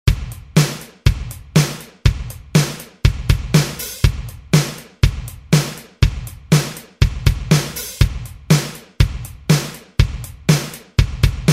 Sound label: Rock, Alesis SR-16; Preset Patterns